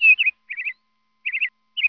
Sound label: peacock chirp